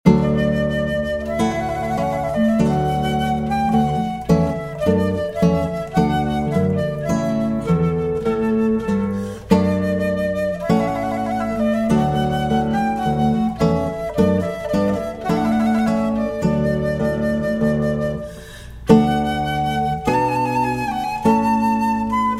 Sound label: Classical Gtr